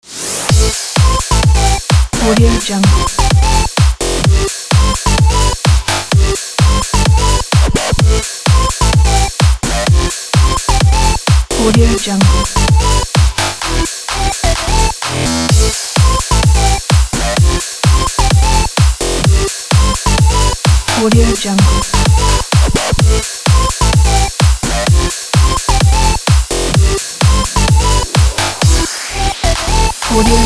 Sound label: summer modern dance party